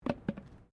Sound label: Witches Hat Place, Traffic cones; Miscellaneous Impact (Sound Effects:Impacts)